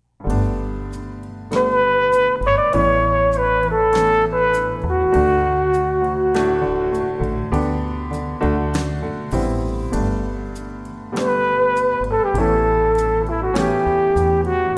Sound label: Simple Song